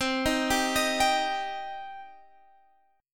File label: C Minor C down Guitar Standard; arpeggiated; JGuitar